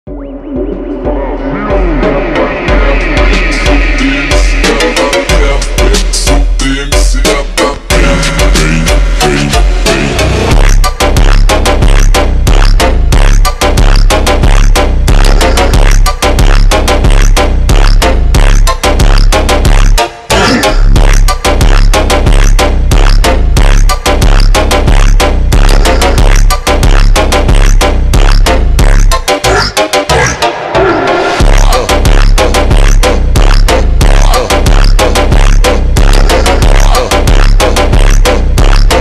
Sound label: super slowed reverb